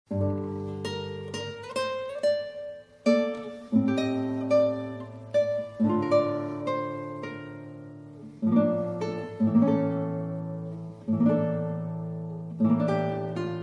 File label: Jade Zither Sorrow